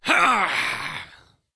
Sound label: daemon die; propack; magic